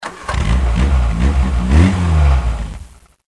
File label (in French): Démarrage voiture